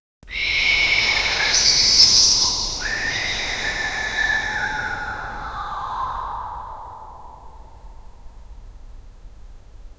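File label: hiss whisper echo layer, cathedral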